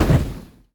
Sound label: tower necromancer basic Attack hit